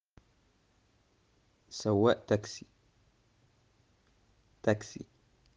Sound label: traffic